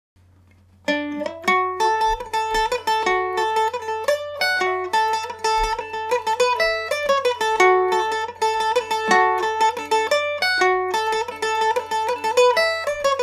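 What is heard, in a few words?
D major md304, Irish mandolin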